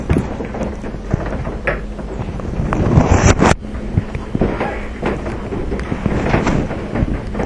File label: right after backwards sound; Springfield Carriage Co